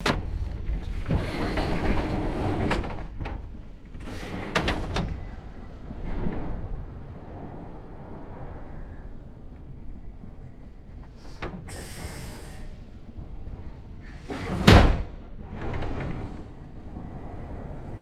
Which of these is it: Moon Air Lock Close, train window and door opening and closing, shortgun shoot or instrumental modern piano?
train window and door opening and closing